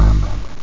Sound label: dock